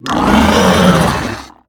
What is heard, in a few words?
Evasive Chimaera Attack